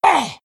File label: pain; disruptor